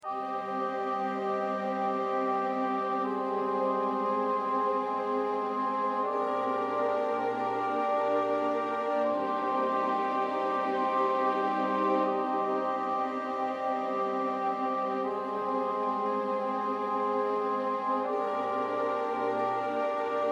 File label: Transition Sad Music Ending